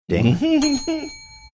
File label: cute laugh